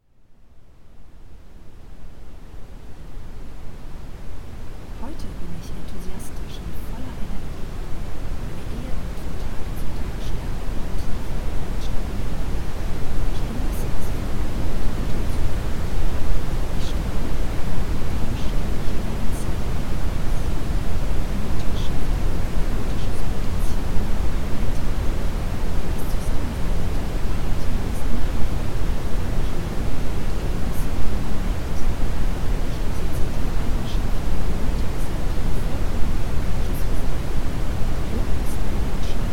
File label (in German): Rotes Rauschen